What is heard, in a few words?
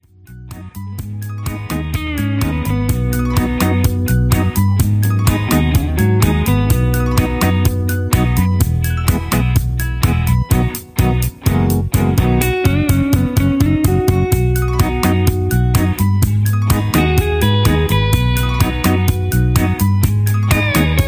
Instrumental with No Leads